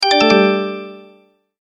Multimedia Tone-Return/Back

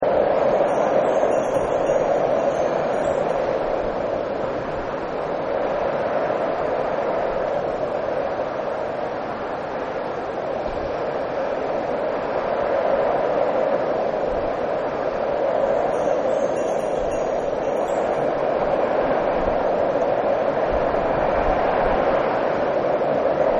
Chimes Wind; Weather

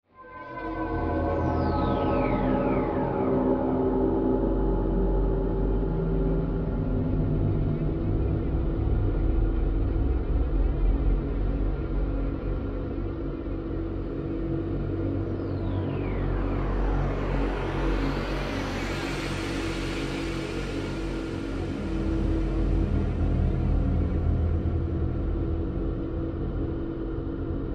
Horror Drone Bed Deep Vox; Scary Tones; Blastwave

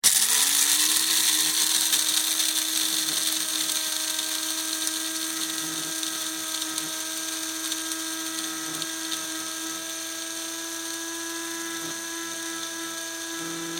Household Coffee Bean Grinder Start Run Stop; Appliances

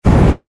Science Fiction Flame Booster Short Burst; Spacecrafts

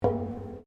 Footsteps Boots Metal Spiral Stairs Steps; Footstep Foley; Human

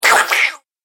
Horror Gremlin, monster

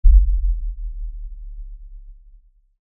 LFE Ultra LFE Hit, Lfe Ultra Low Bass Hit Impact; Deep Tone

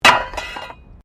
METAL SPIKE FALL; Materials

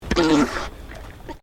ANIMAL ALPACA MALE GRUMBLE; Alpacas on a farm in Gippsland Victoria; Miscellaneous Animal; SoundEffects-Foundation1

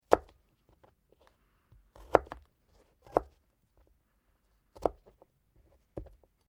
GARLIC CHOP, Food preperation; Food Prep Sound Effects; Food Prep (Sound Effects:Restaurants)

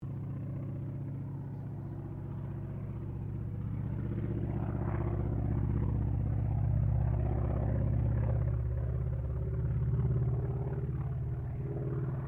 AIRCRAFT CA-28 CERES PASS; Airplanes; Vehicles

